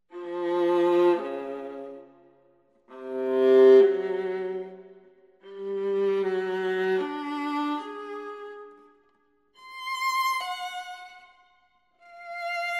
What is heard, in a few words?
Viola; Bagatellen; Elegia